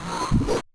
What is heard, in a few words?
swing